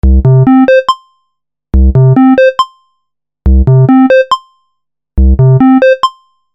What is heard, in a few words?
A-110 Sine